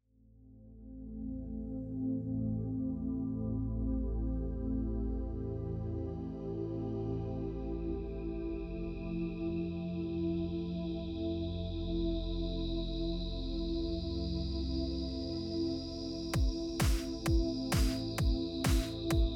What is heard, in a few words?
Peaceful Instrumental